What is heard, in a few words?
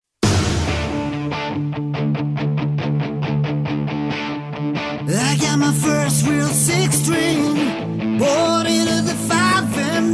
Rock And Pop Trio; West Midlands